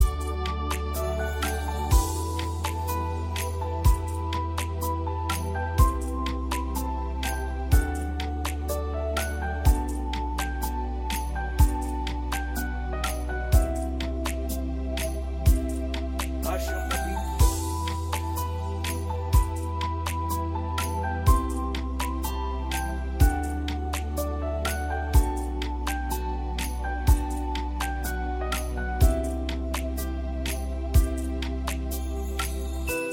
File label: Instrumental Cover Mix